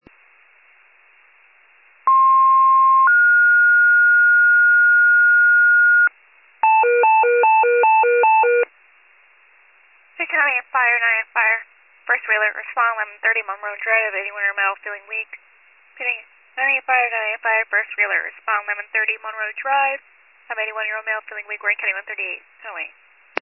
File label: FIRE pager alert